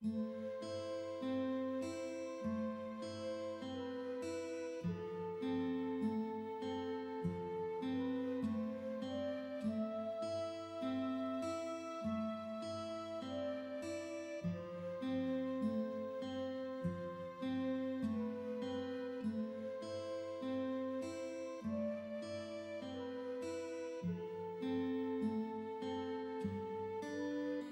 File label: Wind; solo islands of the heart switch 2019